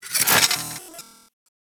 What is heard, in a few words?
Micro Jump; Warframe; Corpus; Arachnoid Micro; Abilities